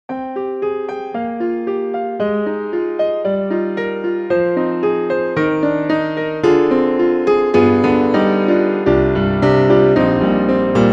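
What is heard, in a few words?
Grand Pop, piano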